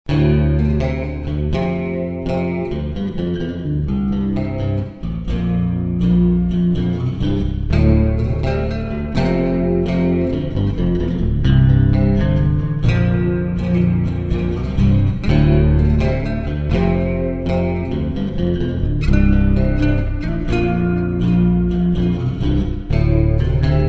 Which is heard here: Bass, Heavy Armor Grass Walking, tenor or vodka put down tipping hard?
Bass